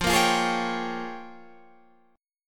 Bb Minor 13th F

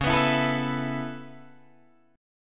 Db Minor 7th Sharp 5th Db down Guitar DADGAD; strummed; JGuitar

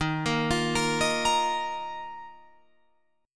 D sharp Suspended 2nd D sharp, Guitar Open F; arpeggiated; 15,17,17,17,18,17; chord

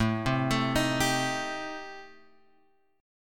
A Minor 7th Flat 5th A, Guitar, Open G

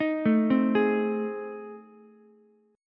A Minor Major 7th Flat 5th A, Ukulele; arpeggiated; AmM7b5; JGuitar

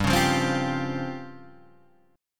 C sharp Major 7th Flat 5th, chord

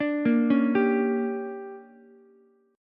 D Major 7th Suspended 4th Sharp 5th, Ukulele; DM7sus4#5; arpeggiated; JGuitar

